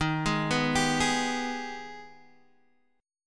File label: G sharp Minor Major 7th D sharp